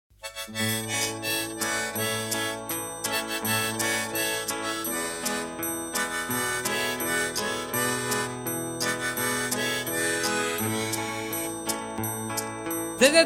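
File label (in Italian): La canzone della Zezza Peschici, dialetto peschiciano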